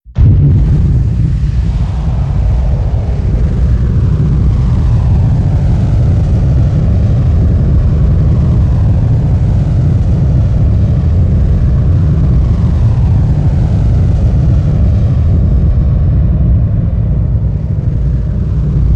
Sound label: shuttle launch; creasey fx